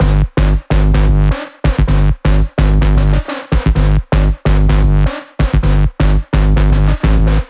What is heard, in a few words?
Crazy Chip Melody 3, 128BPM; Vengeance Samples